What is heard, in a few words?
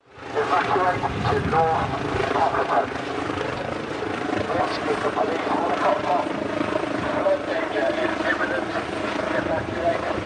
police helicopter